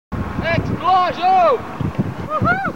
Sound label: explosion